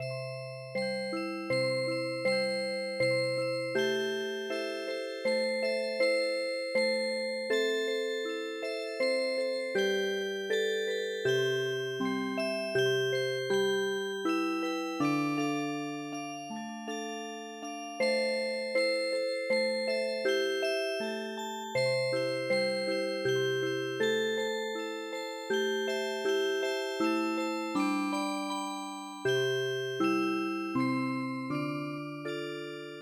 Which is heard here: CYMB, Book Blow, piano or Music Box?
Music Box